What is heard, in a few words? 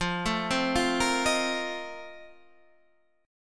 Bb Major 7th Suspended 2nd Suspended 4th